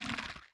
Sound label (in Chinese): 幽匿蔓延声